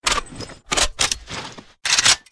m16a4 reload; weapons

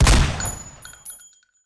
mini14 fire, weapons

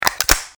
arena closer reload; weapons

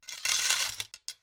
hatchet switch; weapons

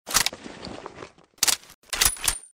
mini14 reload; weapons

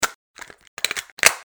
radio reload; weapons

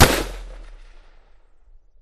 negev fire, weapons

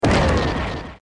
deathray fire; weapons

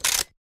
mosin reload, weapons

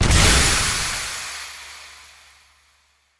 smoke grenade

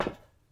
metal step; footsteps

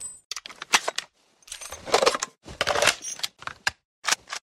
lewis gun reload; weapons

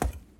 wood step; footsteps

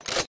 cz75a switch; weapons

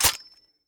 m1 garand switch; weapons

